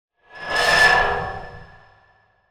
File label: sound design harsh metal scrape distortion reverb